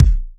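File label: kick, Boss DR-202; Drums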